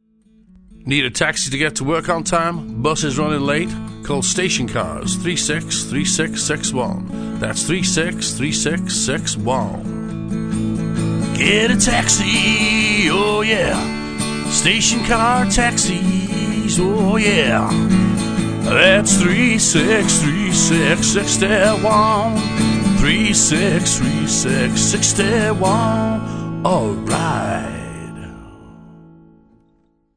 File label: station cars taxi jingle